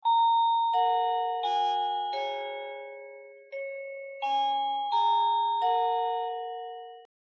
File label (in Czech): melodie